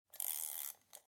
Kueche Teeuhr

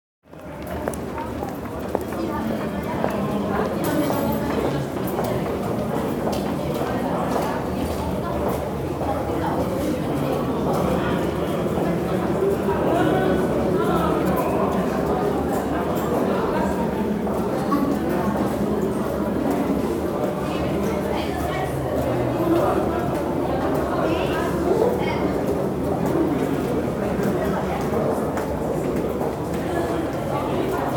Stadion im Tunnel

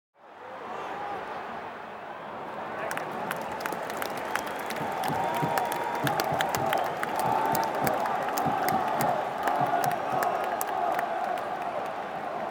Stadion Atmosphaere

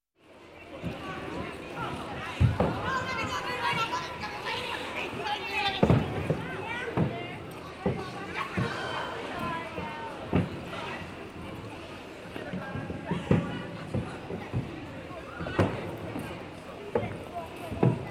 Sport Schlittschuhlaufen

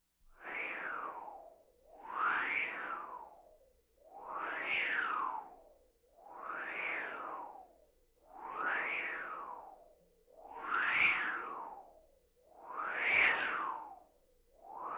Kosmische Klaenge Kosmische Winde

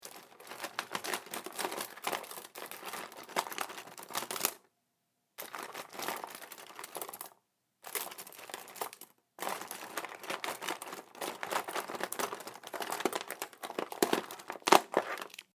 wuehlen

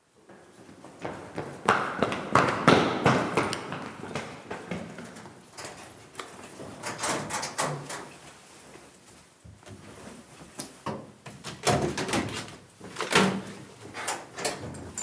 laufen in kabine

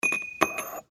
Moerser

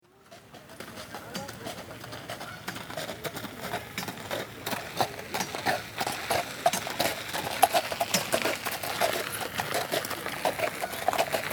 Pferdekutsche

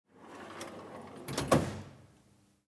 Schublade

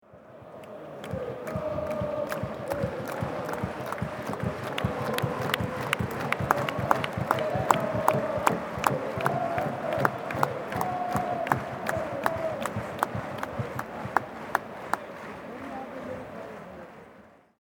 Stadion Fan und klatschen

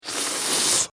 rauchen

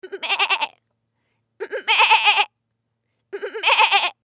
Mensch maeaehhh

